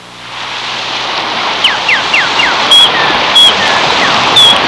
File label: beach in; Sound Library 2000; Wayzata Technology; Music In